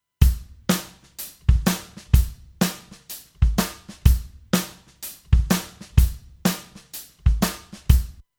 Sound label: Drums